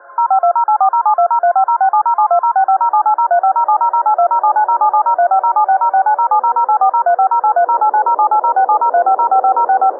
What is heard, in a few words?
Signal track DTMF filtered